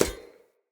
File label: Unarmed Fey Parry Impact; Weapon Impacts; Player; Combat